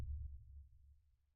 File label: sonar Tail Air Medium Beacon Directional; Barotrauma; Real Sonar